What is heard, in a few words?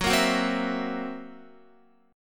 F sharp 7th Flat 5th, down, Guitar; strummed; 4F,4A,5C,5G,5C,6E